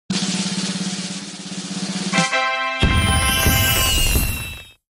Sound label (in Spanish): burbujas